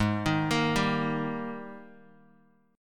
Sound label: Ab Major 7th Suspended 2nd Ab down Bass Standard; arpeggiated